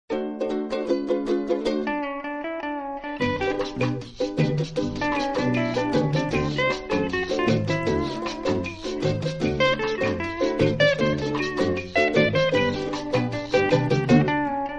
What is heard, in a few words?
rhumba music; the chum bucket